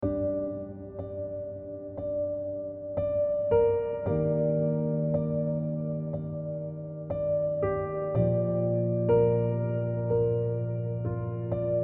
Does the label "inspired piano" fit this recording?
Yes